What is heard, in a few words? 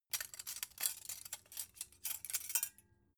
dough hook handle; industrie-wirtschaft; gastronomie